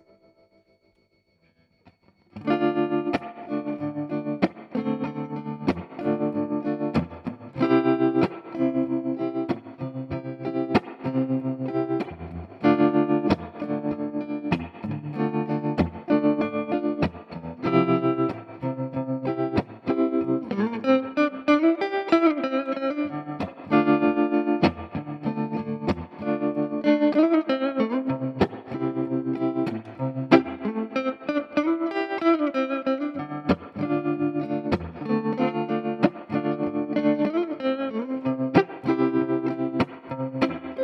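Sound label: guitar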